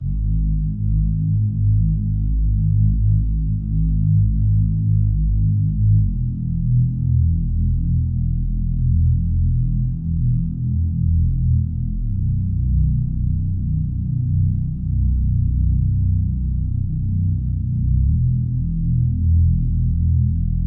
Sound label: Bass, 80 BPM G# Min, Ambient; Melody Loops; Moments; Cymatics; ANALOG EVOLUTION Beta; Ambient Collection